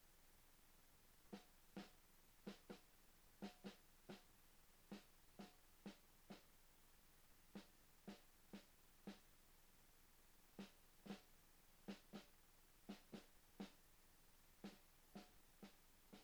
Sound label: drum